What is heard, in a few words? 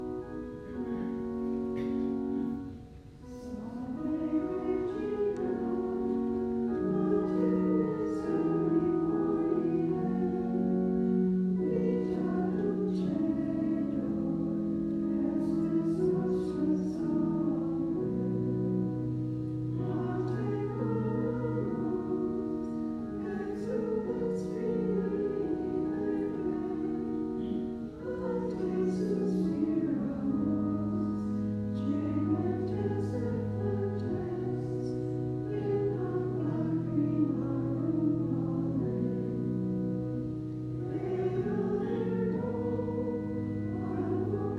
Sisters Singing Salve Regina in Chapel